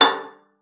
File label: PIZZ, VIOLIN; Xsample VOL-5; 90 s Sample CDs; VIOLIN FING